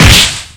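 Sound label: Battle Hand Critical; Etrian Odyssey III HD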